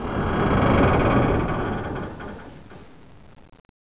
ship creak; borg

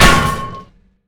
steampipe hit; weapons; deluge

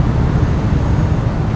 City, ambience

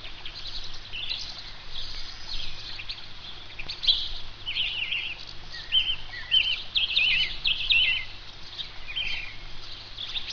birds; exo

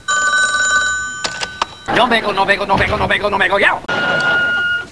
ans phone; cs reloaded

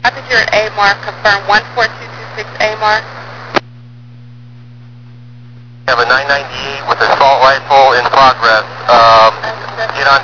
police

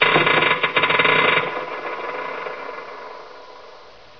creak; dm borehole

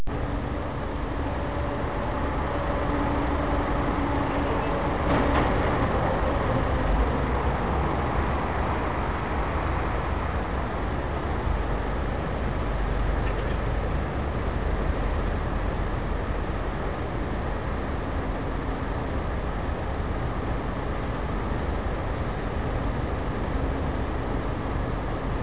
city

